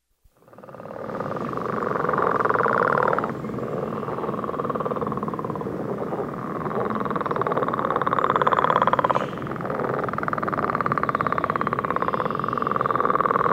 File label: Northern Leopard Frog; frogs